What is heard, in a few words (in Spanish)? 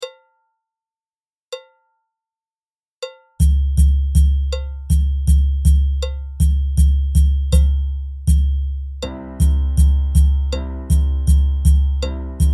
oh susana con percusion